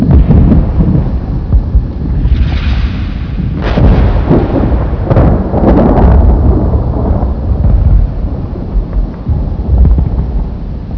battle; dinant

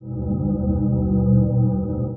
low drone loop

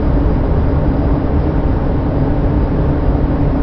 pump

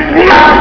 jump; grimlock

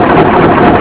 diesel

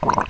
gurp; openffa

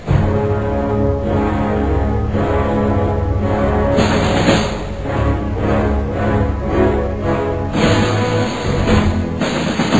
tune; chaos

